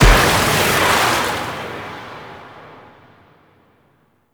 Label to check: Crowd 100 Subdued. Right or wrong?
Wrong